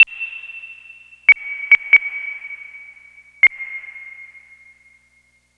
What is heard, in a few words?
Blip Melody; Electronic Point Blank